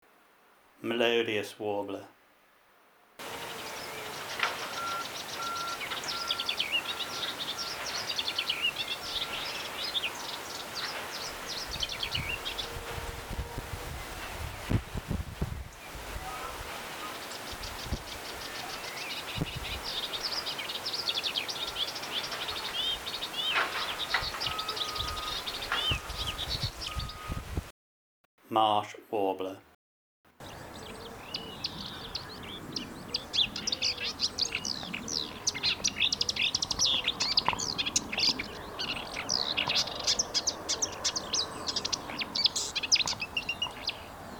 Melodious cf Marsh

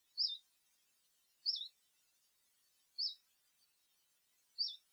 sweeoo

